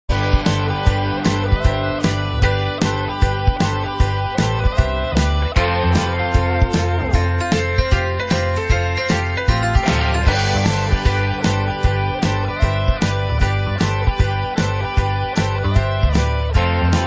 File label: Bounces (Glam Rock Hammond Galore)